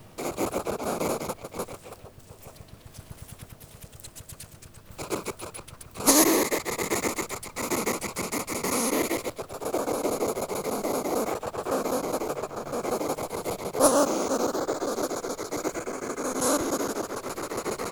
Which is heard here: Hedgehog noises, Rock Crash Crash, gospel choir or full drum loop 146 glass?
Hedgehog noises